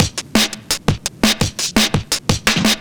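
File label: Drum and Bass Beat 170, drumnbass 170bpm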